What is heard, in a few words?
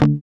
guitar, E-GUITARS; Sound & Vision; Gigapack I CD 2; GUI E-Guitar 3